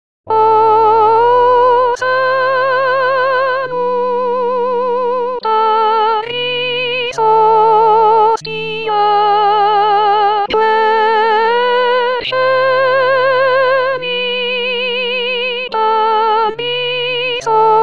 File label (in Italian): O salutaris hostia soprani, voci guida; corali in vaticano 2018